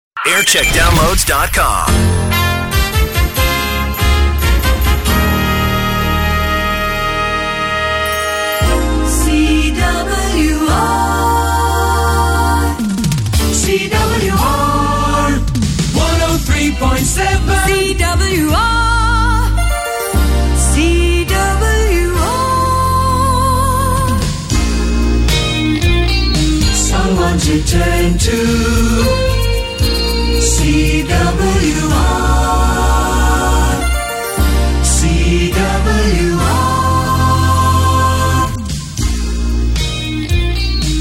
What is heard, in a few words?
Jingle Montage; CWR; Alfasound; 1990